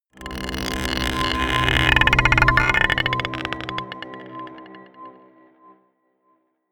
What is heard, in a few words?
scrapes marble pot dark, drones